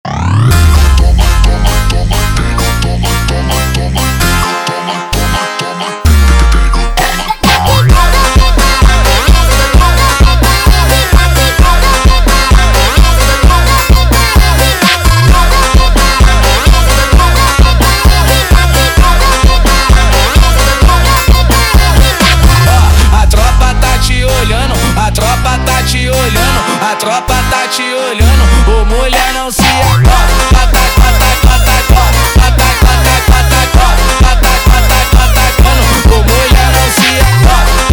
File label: Funk